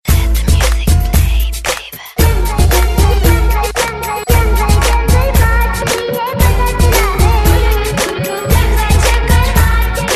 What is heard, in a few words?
rap